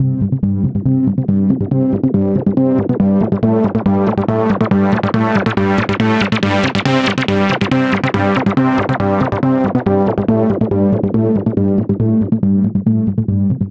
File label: NRG Sweep, Trance